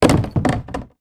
boat kayak paddle throw on boat; transport

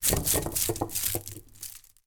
household tin foil roll push roll on surface

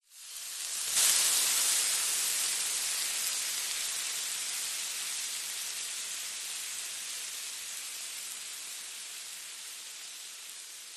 household water sizzle hot pan; zapsplat

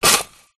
industrial shovel into hot coals fire single; zapsplat

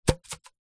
sport cricket bat drop grass

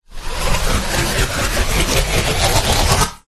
Sci-Fi Power Up; Ryan Van Eerde

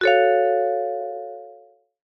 fantasy magic wand ping retro style; zapsplat

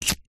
household cigarette lighter flint flick

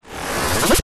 science fiction item suck through teleport

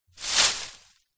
impact tree branch fall down ground

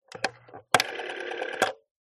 household telephone old rotary dial

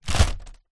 balloon foil helium bounce impact

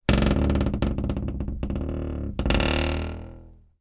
household door creak wooden hollow

